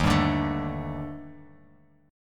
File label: Eb Major Sharp 11th Eb Bass Standard; EbM#11; strummed; 11,10,12,14; JGuitar